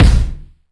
crisp kick sound b key, Royality free kick drum single shot tuned to the B note